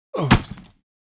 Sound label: die; Monster; 만리보